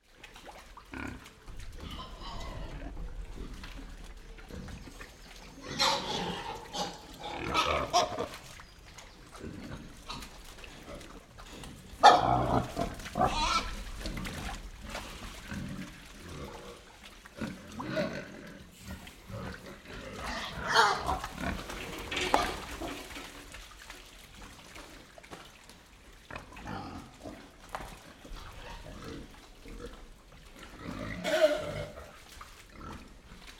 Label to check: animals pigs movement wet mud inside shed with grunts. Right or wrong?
Right